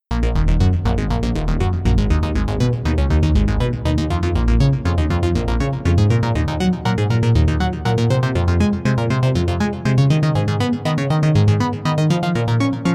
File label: bass arpeggio 3 saw waves